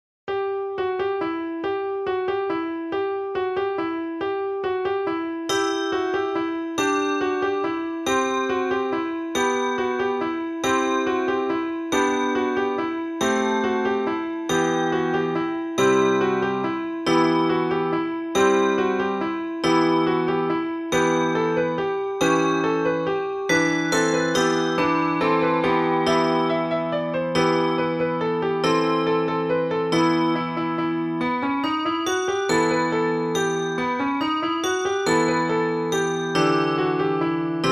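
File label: Ukrainian bell carol; leontovich mykola; pour clochettes anglais et piano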